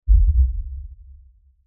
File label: Impact Lfe Rumble; Low Impacts; Sound Effects:Impacts; Blastwave